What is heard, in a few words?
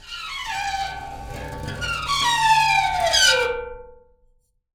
Pane Of Glass Rub